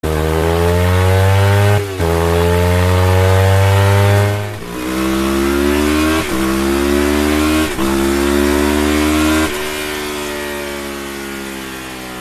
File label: superbike